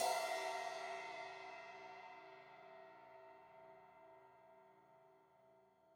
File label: Crash 16in edge, Cymbals; Deeply Sampled Drums